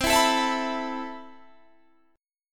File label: A Suspended 2nd C; A2/C strummed